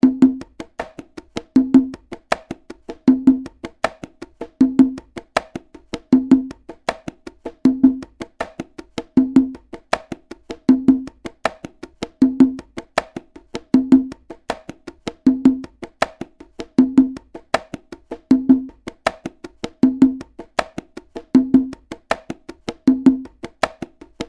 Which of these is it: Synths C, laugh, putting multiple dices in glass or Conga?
Conga